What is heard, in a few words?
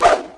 jump modern; sonic heroes